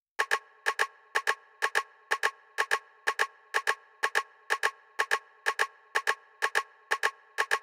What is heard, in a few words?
125, Perc Loop